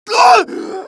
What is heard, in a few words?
death; mohaa; dialogue